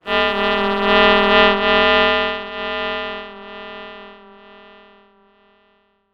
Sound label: Transition Stab Dark Bell; Production Element; Title Transition; AdobeCC